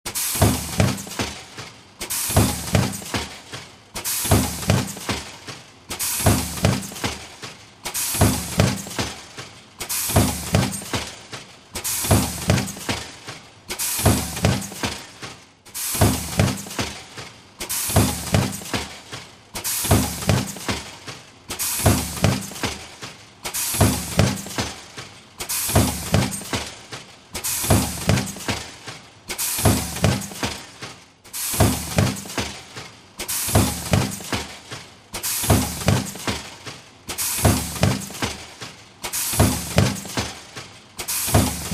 Rock Crusher Running, factory

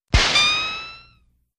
Ricochet Elect, bullet bys & riccos; Ricochet, electronic

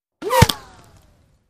Bullet Impacts With Whir In, Impact And Debris

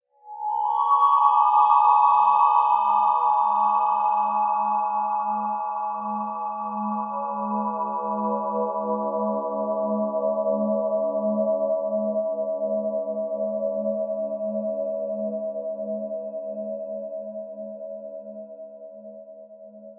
Stab HF Elect Tone

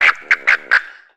Frog Tree Ribbits

